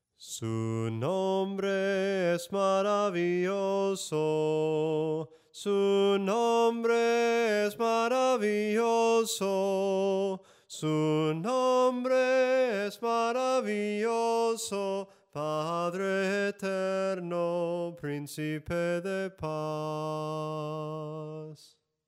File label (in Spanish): SOPRANO CANTADO, himnos en melodia soprano